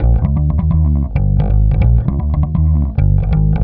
Bass

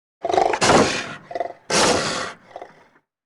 wildcat hiss

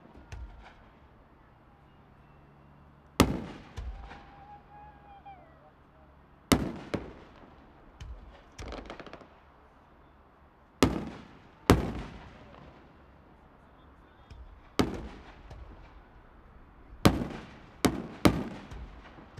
fireworks; Ambisonics; tetra

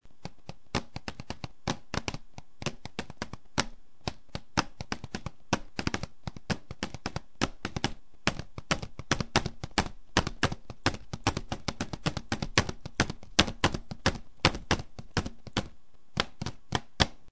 cadence

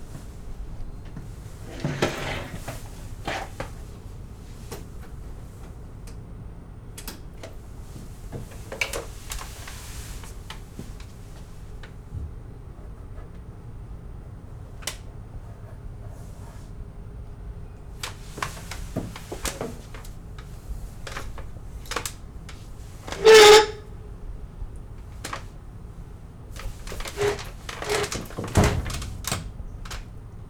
Chair scrape

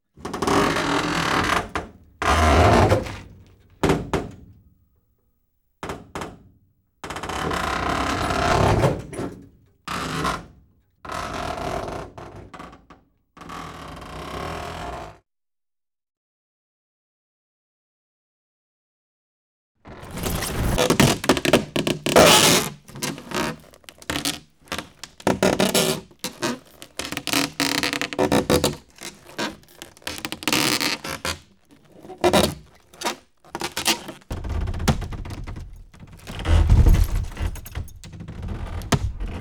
wood large creaks; Asian Show Sound Effects

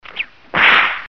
whipping

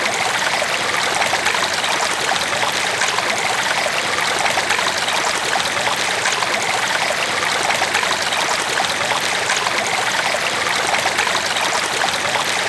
Brook

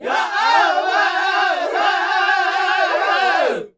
Dine group vox

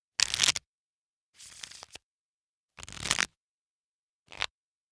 card shuffle; Asian Show Sound Effects

